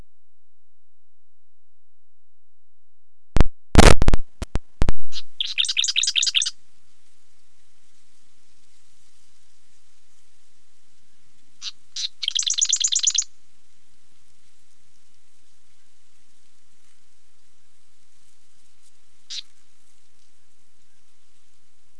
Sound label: marsh wren, bird songs of WA calls